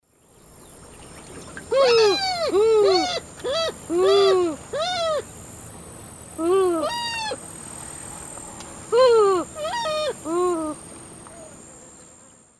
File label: Porphyrula martinica, calls of group; S. America; Eastern Lowlands